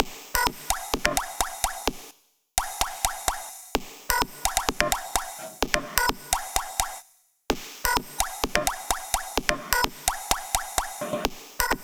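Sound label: Drum Loop 128BPM Percussion; VEC5 Drumloops; VEC5 Multi Loops 128BPM; Loop Elements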